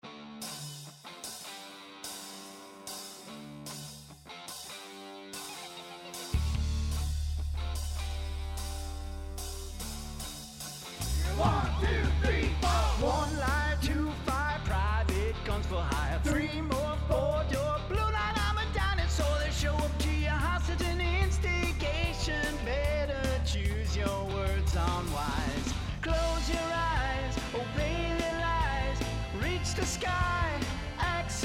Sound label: Heavy VOX rough; Audient